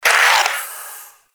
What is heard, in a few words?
Shadow Vocal Attack; Warframe; Duviri